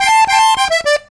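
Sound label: Accordion Lick, C; Zero-G Kocktail Kollection; Accordion Lix; 90 s Sample CDs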